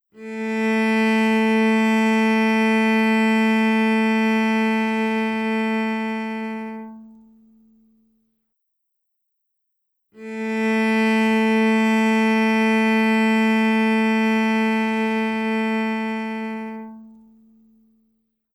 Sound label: Tuning note A, cello